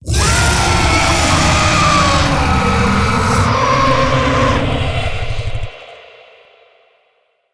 Vocal Death; Warframe; Zariman; Void Angel

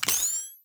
Pistol Laser Aim; Warframe; Tenno; Lasrian

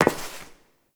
Step Metal; Warframe; Koumei

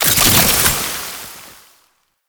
Alchemy Grenade Pickup Toxin; Entrati

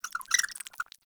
Rat Pet Squeak; Warframe; Ambience; Nineteen Ninety Nine

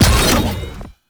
Exalted Sniper Cast; Frumentarius

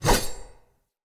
Saw Dagger Whoosh, Weapons Swords; Player

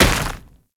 Moa Step Dirt; Warframe; Footsteps

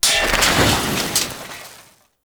Fire Extinguisher Destroy; Ambience; Gameplay; Warframe; Nineteen Ninety Nine

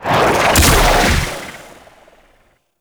Ranger Cast; Warframe

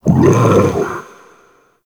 Large Pain; Warframe; Shadowgrapher

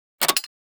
Mech Release; Tenno; AK Bolto Prime

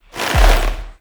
Drifter Pull

